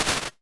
Wide & Small, Impulse Responses, Small Spaces, Rooms; Apple